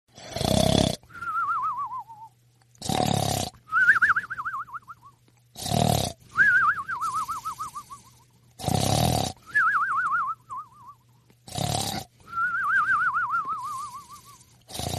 cartoon snoring sound effect

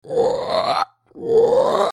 toad or something

